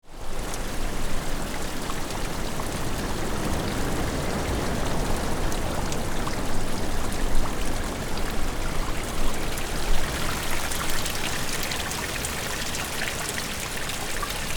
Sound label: soft sounds of water